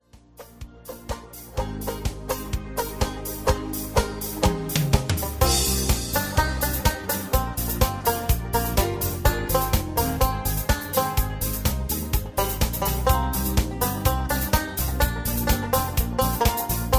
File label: Thumping Banjo Patter